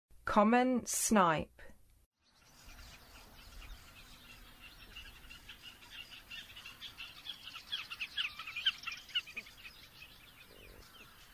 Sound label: Common Snipe; North America